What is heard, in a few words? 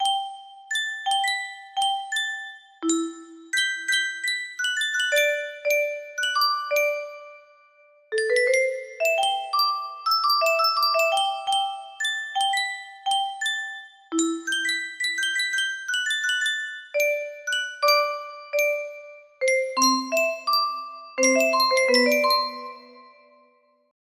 opening riff, music box melody